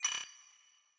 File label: movement run; smite; vamana